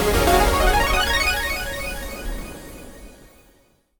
music super mega win text change; reef raider

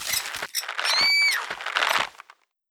Pull Rope; jackhammer 2

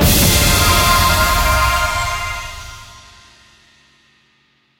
bigWinEnd; hiddencoinsofegypt

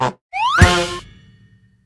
rudolf landing; santa vs rudolf

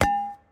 rooster catch; eggomatic

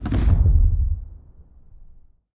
nudge step; rise of maya

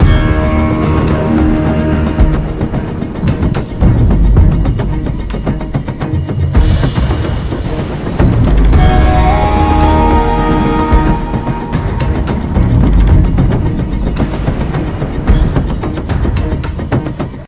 free Spin Intro Loop; riches of midgard

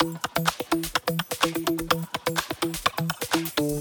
pick n click music loop; vegas night life

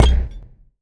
win Refill; wildworlds

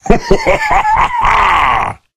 candle Goes Off Screen; rage of the seas

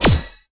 cash stack symbol appear; pork knox

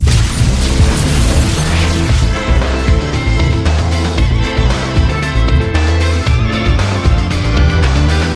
big Win Start; wildotron3000

